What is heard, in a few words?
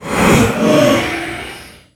Wailing Soul Death